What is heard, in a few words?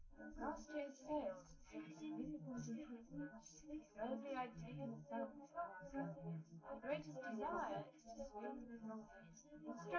female live rec 250ms 1m; SiSEC11; underdetermined